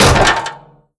vent step, headcrab reviver, creatures

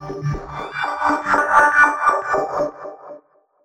hacking holo appear

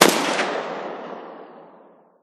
ssg08 distant, weapons; Counter Strike Model Pack; CS2

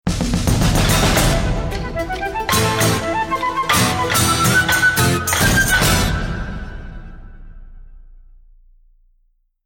round mvp anthem

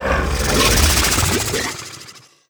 idle out, barnacle; Half Life Alyx

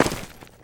footsteps, dirt; player

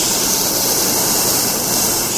ceiling tile scrape smooth loop, plaster; physics

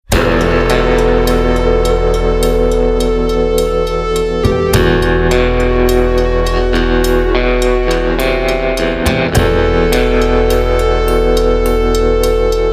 bomb planted

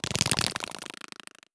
idle clicks, headcrab black; creatures; vox

